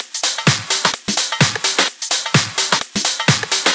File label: House Loop, 128 BPM; Vengeance Samples